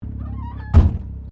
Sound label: Car Mazda Rx7 1983 Series3 Interior Idle Door Close; Vehicles; Cars